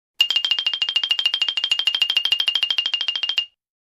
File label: Funny xylophone cartoon running sound effect